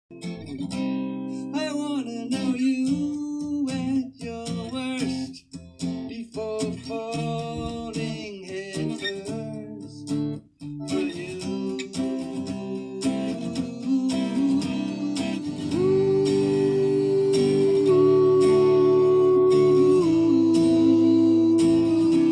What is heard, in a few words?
Harmony